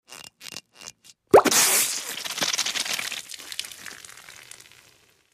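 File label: Champagne Cork Squeak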